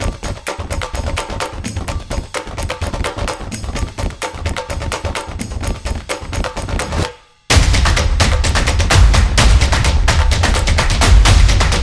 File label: Opener Stomp Beat